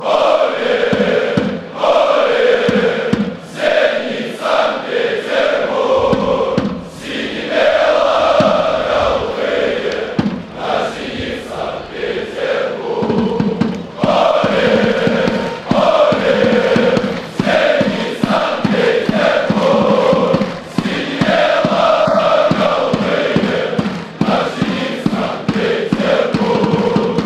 ole, fanchants; zenit st petersburg

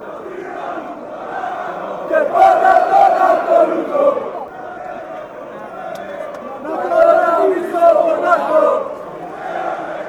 chant, fanchants; olympiacos

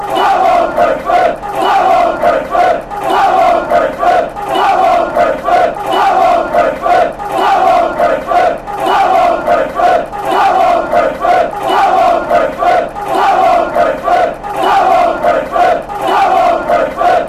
come, chants; brentford

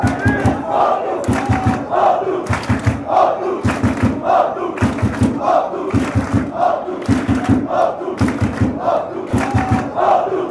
chant, fanchants; fc thun

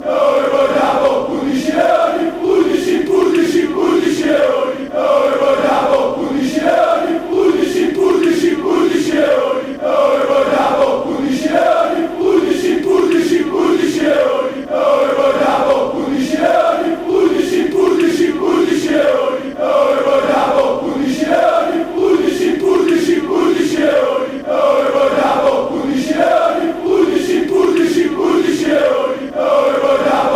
fan chants

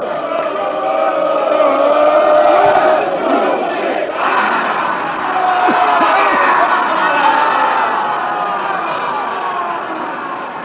you are sit ah reading fanchants

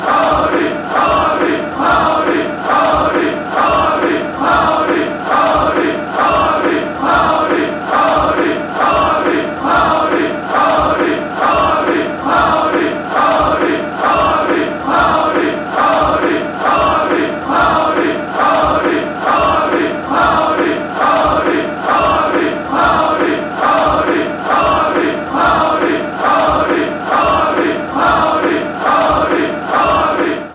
chant, fanchants; excelsior

